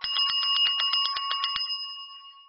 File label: Pub Bell